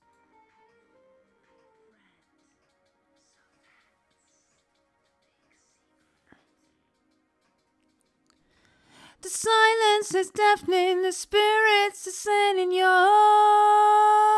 vox

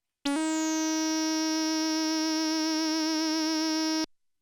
moog

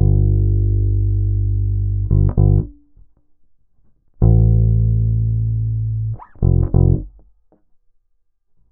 BASS

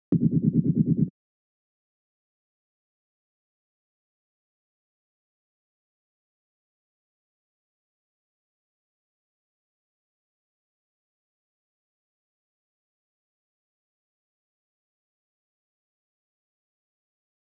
120bpm heli

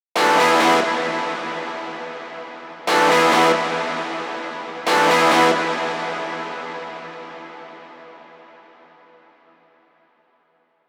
120bpm gtr

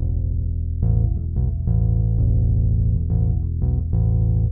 BASS

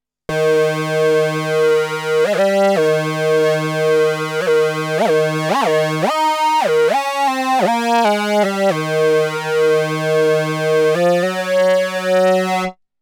MOOG

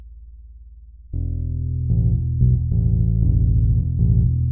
BASS

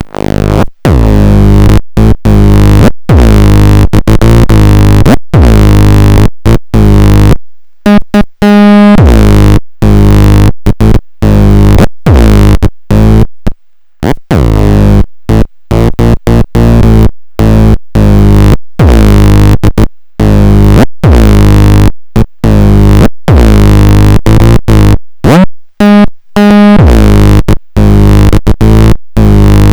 Ext. Instrument; juno6

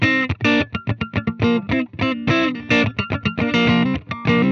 GTR

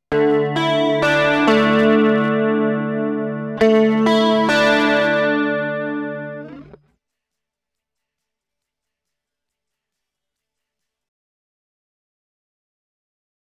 mild lead gtr

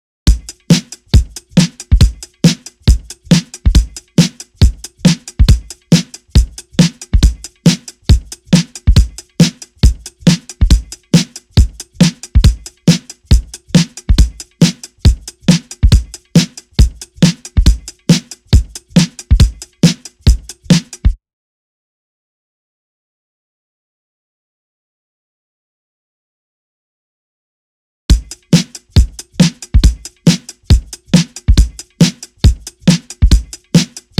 drums

